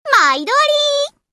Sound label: night attack; shiratsuyu